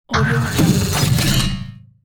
robot fx

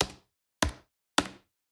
frisbee handling; collanderson

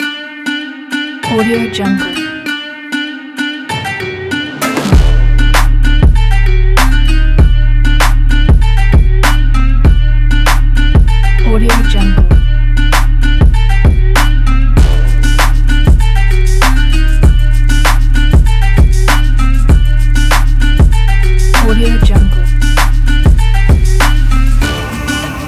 trap beat

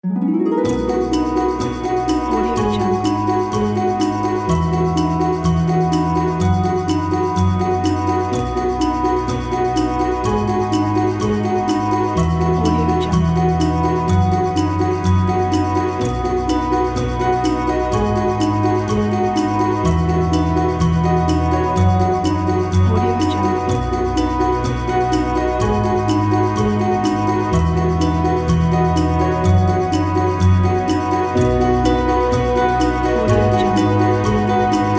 christmas miracle

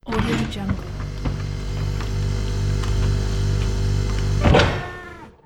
robotic mechanism

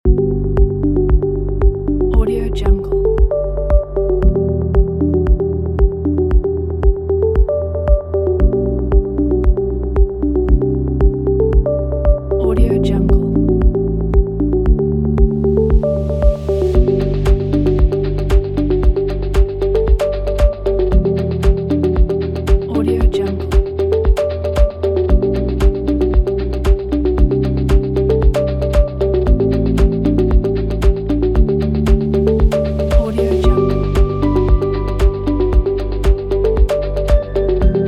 minimal happy

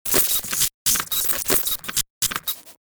mechanism tiny bot layer; soundmorph

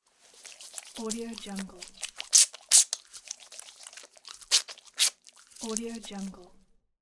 washing soapy hands; applehillstudios

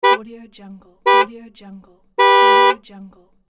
car beep

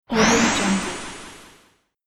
time jump scifi game action

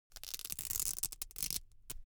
foley velcro open cap slow

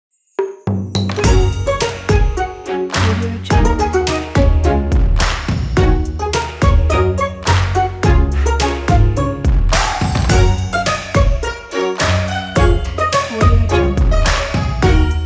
a chinese new year

